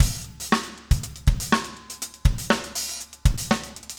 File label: funk 120 bpm, loops